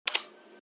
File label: keytyping 4; mintty